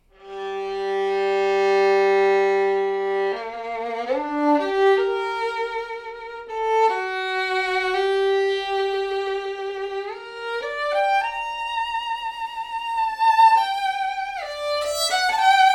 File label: czech stradivarius